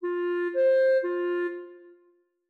5 notes F min

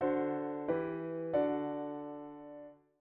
diat V7 inv

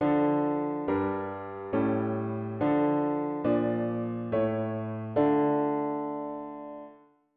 root V7 bass

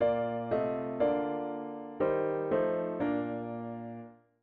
chord prog chunks diat inv and V7

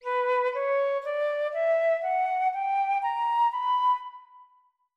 harm minor b4

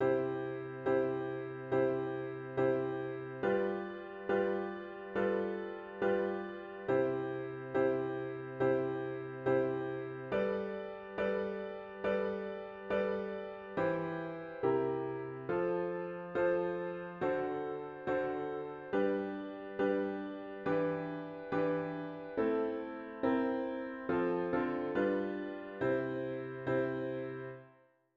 refrain w chords

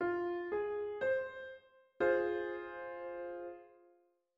triad minor F4

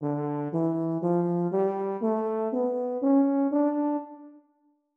mel minor D3

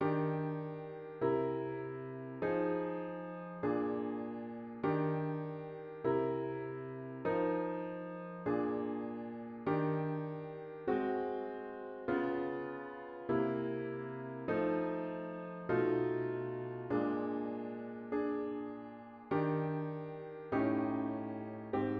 guide tones

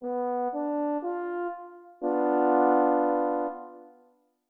triad dim B3

